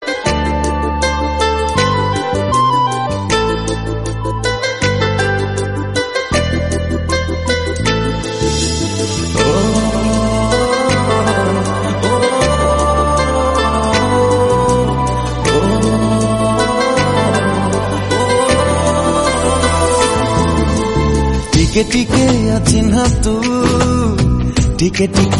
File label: bollywood new ringtone